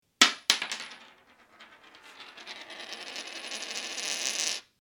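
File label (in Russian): evromoneta upala, euro coin falling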